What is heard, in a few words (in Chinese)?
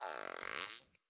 海豚水中待机声